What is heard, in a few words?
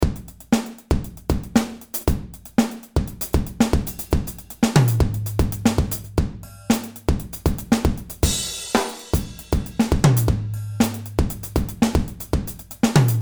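Funk DLX Backyard, drum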